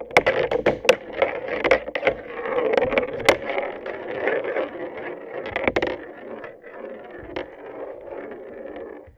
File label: In The Bath, Marbles, contact mic